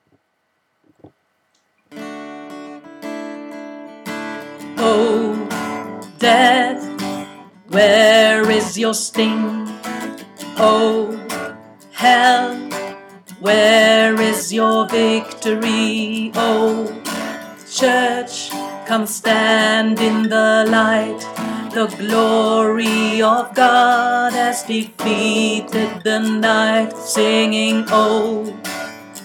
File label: christ is risen tenor